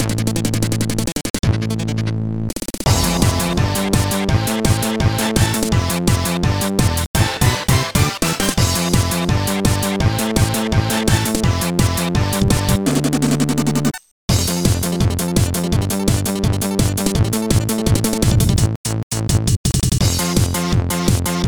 Para-Para Stage Karaoke; pinky street kira kira music night pop town gamerip

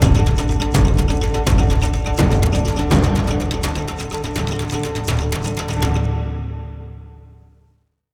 Target Leaves Alert; Sniper Elite 3; KillHitler

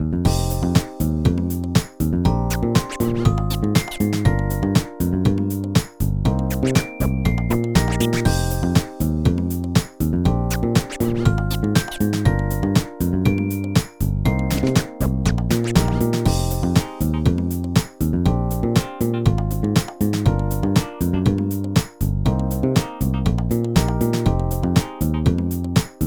Hip-Hop Stage Karaoke; Pinky Street Kira Kira Music Hour Pop Princess; Hip-Hop Stage 4

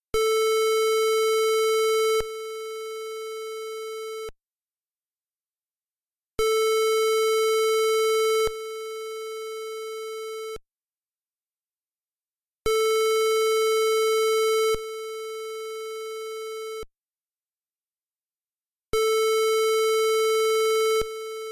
Speaker Test; Dino Rex

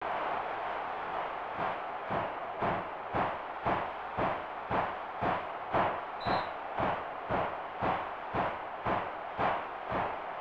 Crowd; WWF Raw; SNES

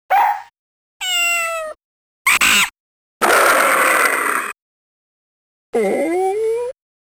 Sound Effects - Animal Version

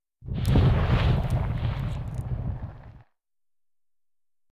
burn web; Kismet

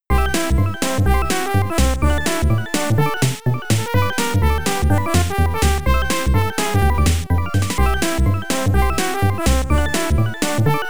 Medieval England Darts Game; Legends; amiga

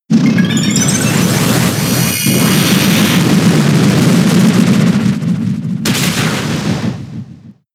Explosion; pokemon sfx gen 6 attack moves xy oras

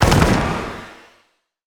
Impact; portal quest android; Antihero Skill1

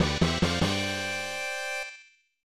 Important Item, No Voice; crayon shin chan 2 daimaou no gyakushuu; snes

